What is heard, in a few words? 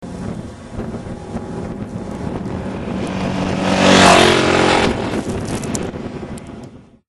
yp250 exhaust note, bike